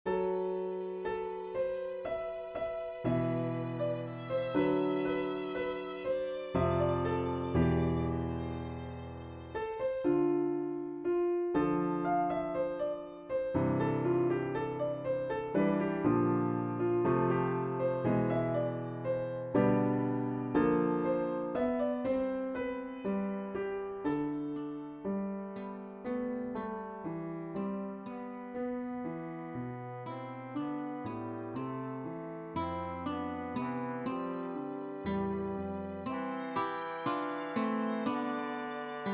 Calm music, This is some music I made myself a long ago using MuseScore; for a loading screen